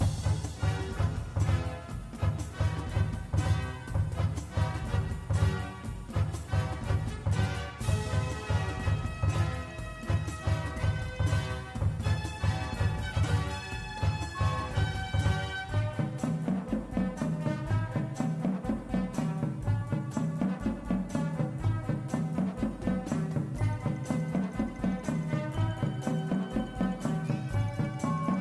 bass with music

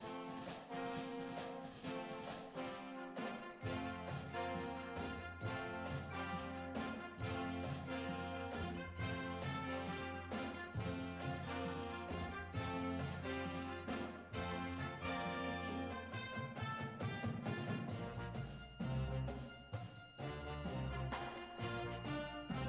cymbals w music

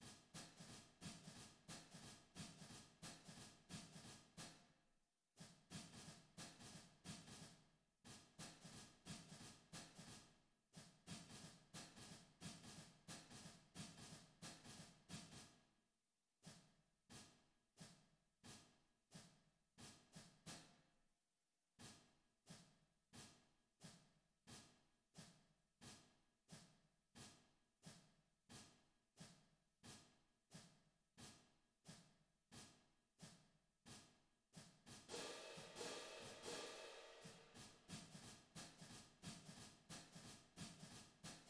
cymbals

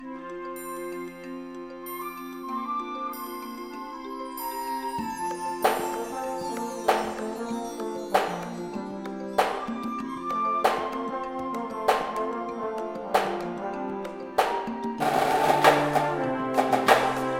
snare with music, drumline

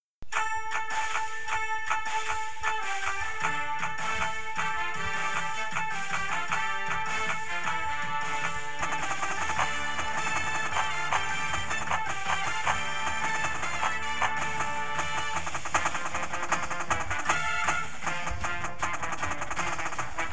snare w music, drumline

